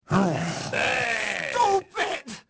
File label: boo; The Elder Scrolls III Morrowind GOTY; XBOX